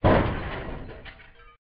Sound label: crusher